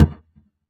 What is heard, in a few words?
impact Plank medium